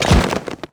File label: pull flesh